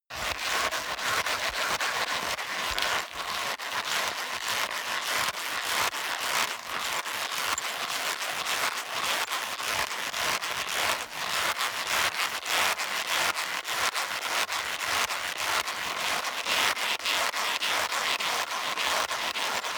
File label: leather creak sequence very fast